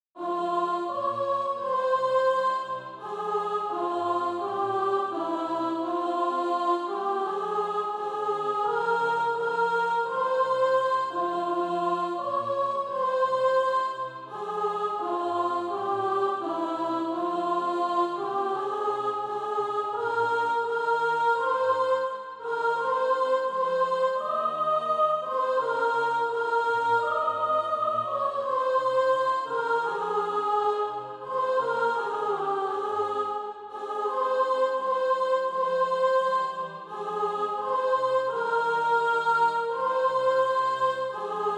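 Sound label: soprano, Practise Music; SATB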